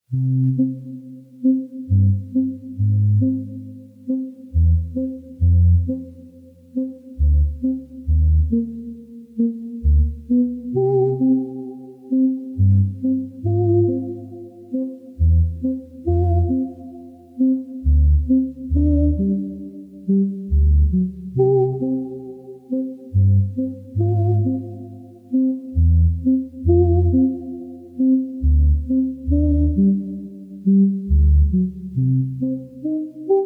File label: SYNTH; Kornél Mundruczó; Volksbühne; soundtrack